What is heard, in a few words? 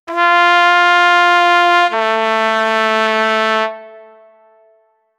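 funeral trumpet; trompeta fnebre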